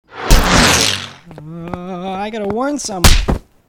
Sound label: Plane; Stealing The Diamond